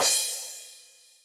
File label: rezo cymbal, Kawai XD-5